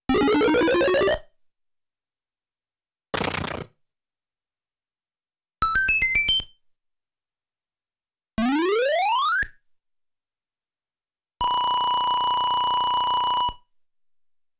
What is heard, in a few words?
The Vine Grows ~ Breakin' Bricks ~ 1UP Sound ~ Successfully Reached the Flag; super mario world live; Timer Count Up